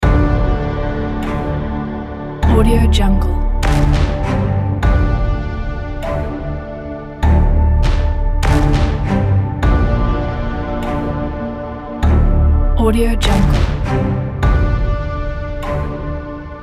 epic intense horror and cinematic; myton music

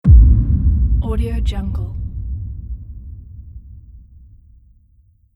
sub hit

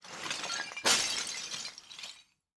rock tiles shaking; matter mayhem; soundmorph

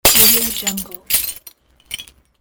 broken glass

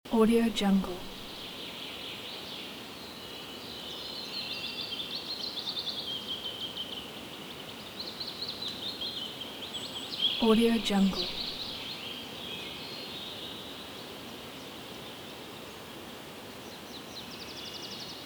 dediceous forest with wind and insects; fxprosound

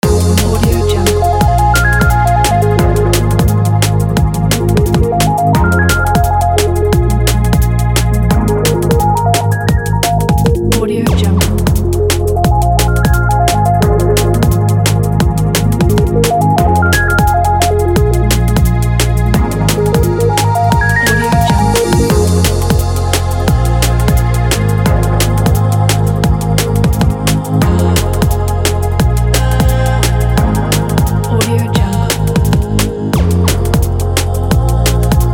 ambient drum bass loop

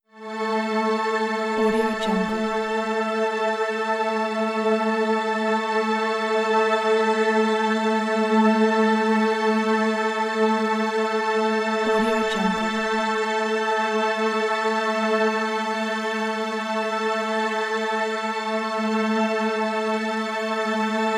ambience harmonic drone

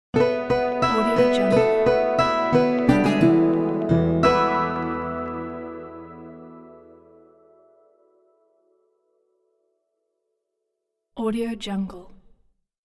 vinyl blues logo

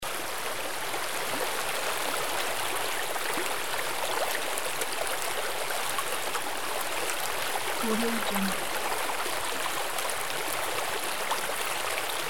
river

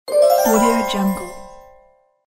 win game level

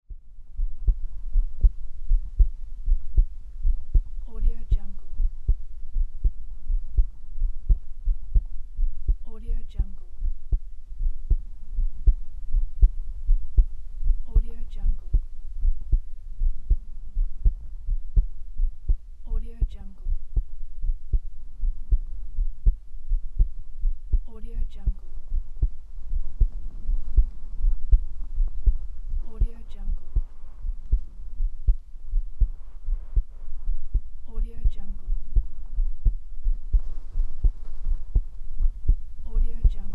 real heartbeat

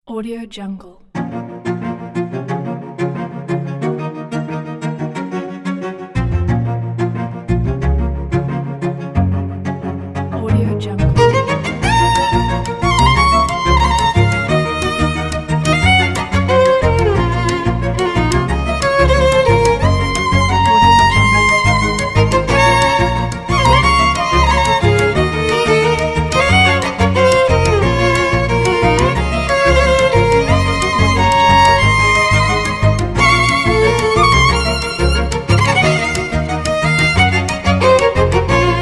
modern heroic cellos and violins

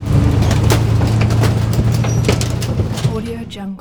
industrial garbage truck; soundmorph

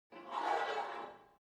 sport gym take off weight barbell quick

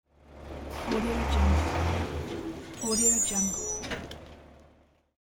truck engine and braking screech

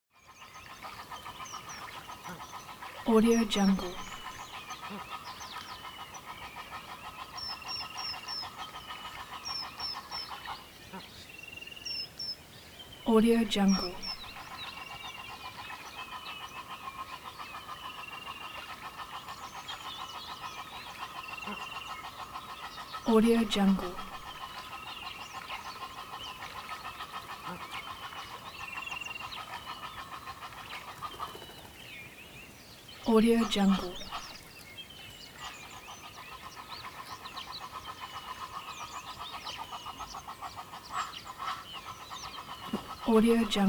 bush water hole early morning duck; atmo; smartsoundfx

